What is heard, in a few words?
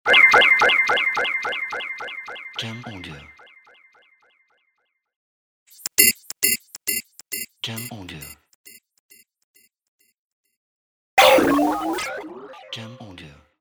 Cosmic Sounds